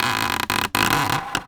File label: Polystyrene Creak